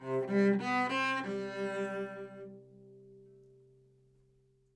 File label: live string phrases, string phrase Cmaj 100bpm; bespoke - Floral v.1